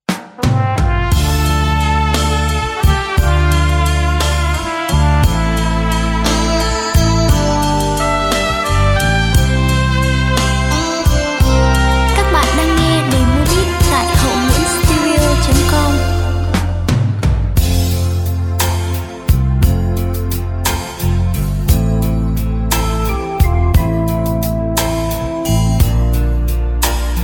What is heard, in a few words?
beat, playback